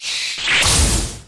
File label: Qurian Attack